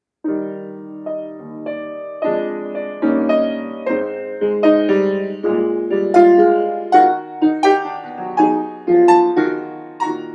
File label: improv Eb maj, piano improvs; “The Stars and Stripes Forever” à la; Variation 2 from Brahms Op.24; (Variations and Fugue on a Theme by Handel); John Philip Sousa; Noam D. Elkies piano improvisations